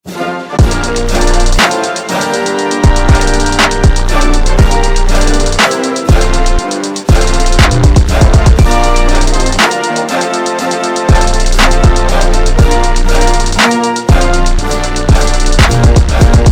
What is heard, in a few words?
us anthem